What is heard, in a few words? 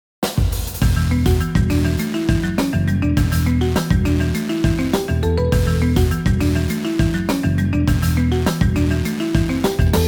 Level Up; Shin Megami Tensei IV Apocalypse